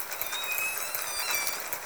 call, J pod, Orca; SRKW; Alwin

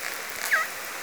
call, SRKW Orca Calls; uk; pssible S45 L pod

